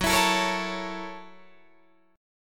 F sharp 7th Sharp 9th, Guitar, Open D

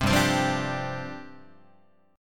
G 7th Suspended 4th G down Guitar; G7sus4 strummed

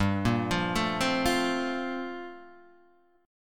G Minor 11th chord; Gm11 arpeggiated; JGuitar

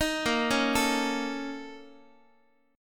Bb Minor Major 7th Double Flat 5th, Guitar; BbmM7bb5; arpeggiated; x,x,6,8,5,8; JGuitar

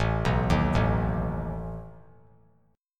A Suspended 2nd Flat 5th A down; Asus2b5 arpeggiated

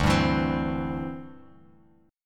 D 9th Suspended 4th D down Bass Standard; strummed; JGuitar

